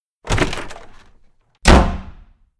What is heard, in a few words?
Slamming Door; Still Life; windows